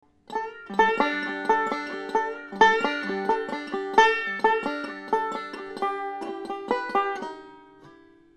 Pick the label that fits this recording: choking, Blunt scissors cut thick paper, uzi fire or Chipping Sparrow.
choking